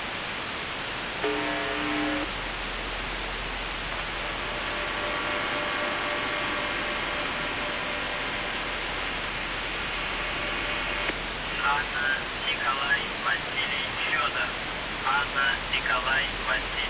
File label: buzzer usb msg